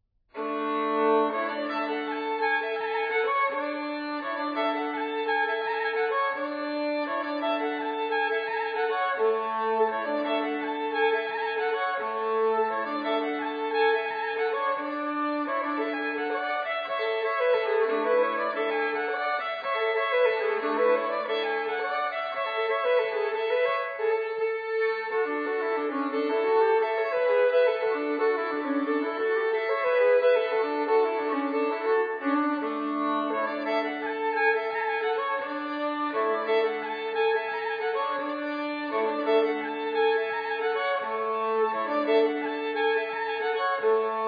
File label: Halling Fiddle; Haugelat; grieg